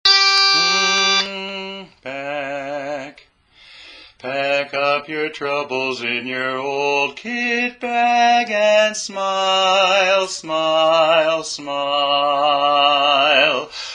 Lead; for god & country music

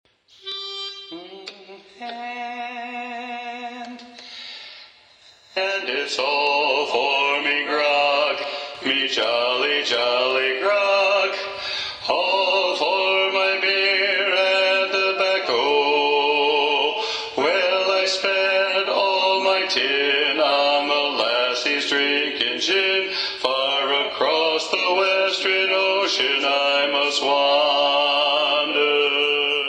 All For Me Grog (Bass Predominant); sea shanties

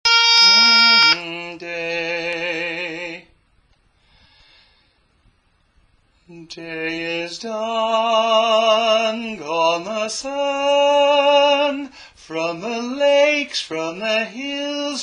Taps Lead; for god & country music

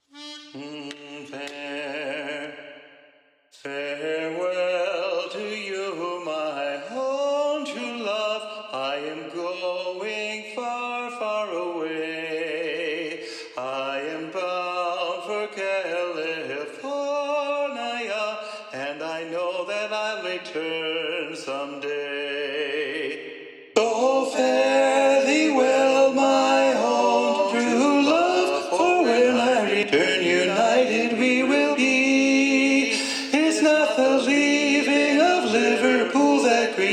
Tenor Predominant; sea shanties; Leaving of Liverpool

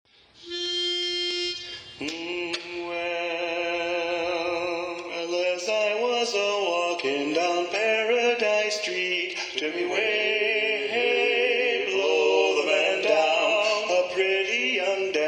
Lead predominant; sea shanties; Blow the Man Down

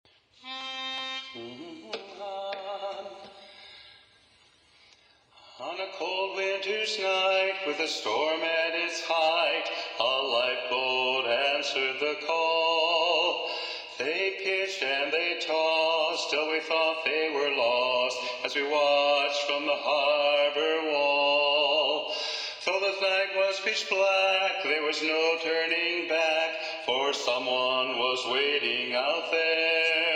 Tenor Predominant, sea shanties

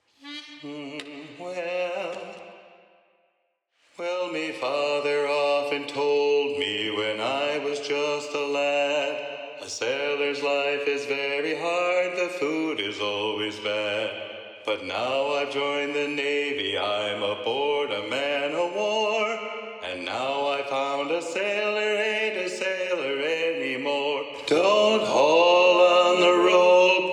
Tenor Predominant; sea shanties

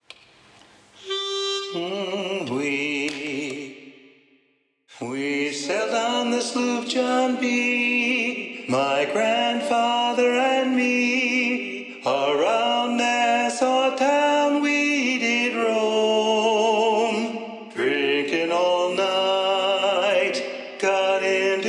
Lead Predominant, sea shanties

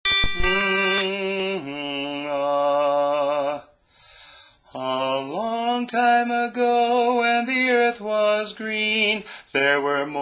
Bass; learning tracks

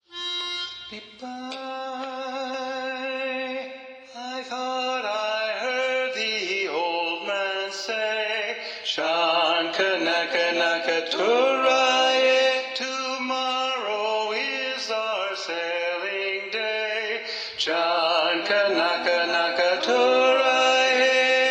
Bass Predominant; sea shanties; John Kanaka

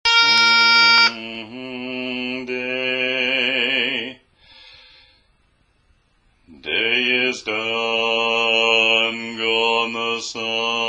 Taps Bass

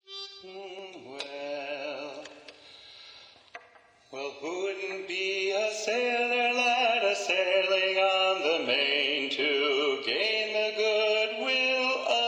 Bass Predominant, sea shanties

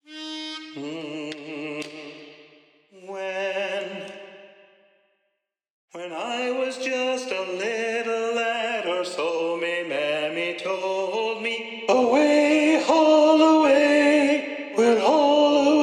Tenor Predominant; Haul Away Joe; sea shanties

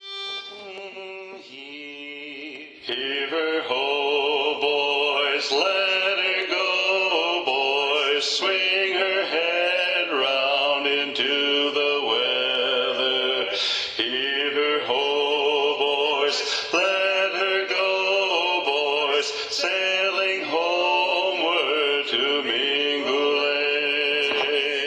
Mingulay Bay (Bass Predominant); sea shanties

